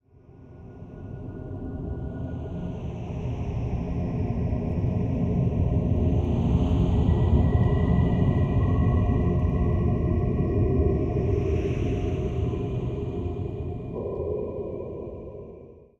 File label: Mansion Mood Amb; Pitch Black Audio Pong